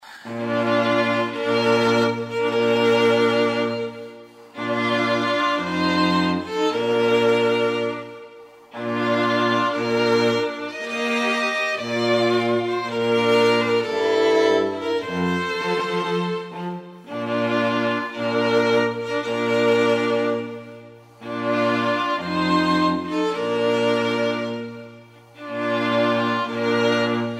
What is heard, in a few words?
bridal chorus